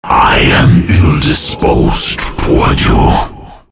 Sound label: outworld destroyer death